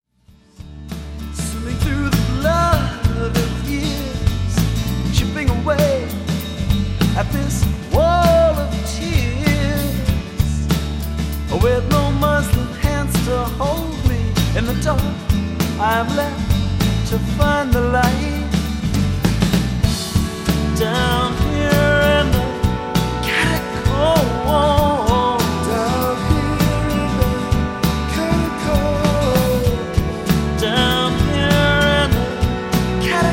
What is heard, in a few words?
stereo excerpt; catacombs